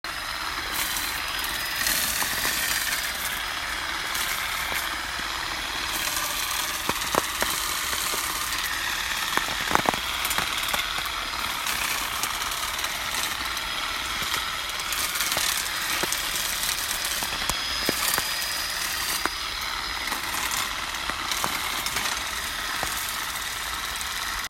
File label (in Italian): Olive con sbattitore teli polietilene; Olive con sbattitore vignale La Spezia